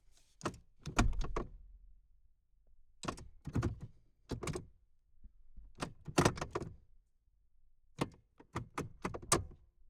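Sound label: Ford LTD foley gear shifter exterior; Ford Ltd Crown Victoria 1990; Pole Position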